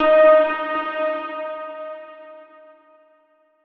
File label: chime; chime sharp